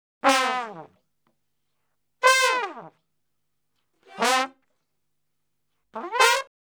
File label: Salsa Picante; Masterbits; World Zone VOL-3